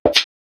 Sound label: Hats, Alesis Performance-Pad